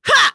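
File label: Vox Attack, English; kingsraid; voices heroes en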